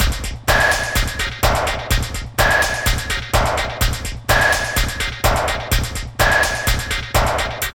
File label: LOOP, Tekno Industrial; East West